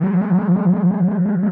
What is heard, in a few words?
Feed chorused, Feedback FX; 99Sounds; Dark Engine